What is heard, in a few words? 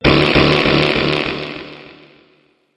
fart; human